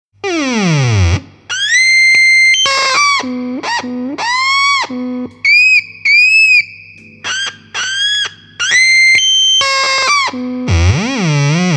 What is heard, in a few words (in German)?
Klingklangschrei